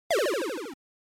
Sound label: NES General Pitch